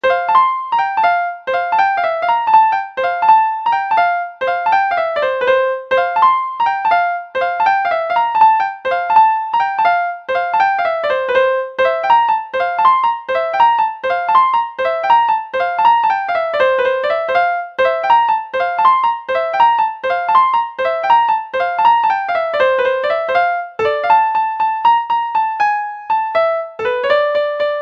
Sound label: french waltz